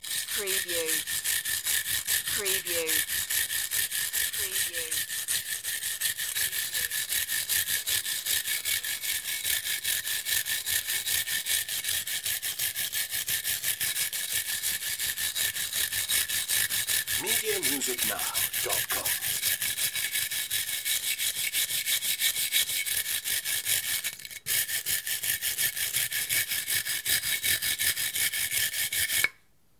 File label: Wire Brush